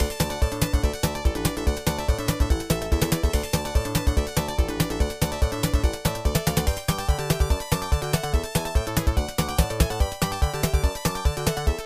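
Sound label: background music; Block Block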